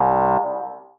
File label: alarm, programmable speaker